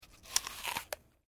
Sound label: Male Eating Apple Bite; Male Vocal sounds; Human